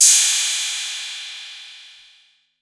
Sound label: 808, CYMBAL; CYMBAL P2